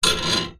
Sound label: industrial bolt thread rub scrape on metal resonate